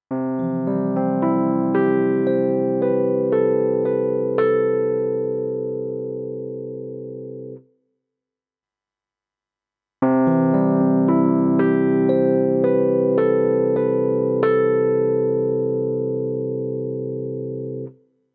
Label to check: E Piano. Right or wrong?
Right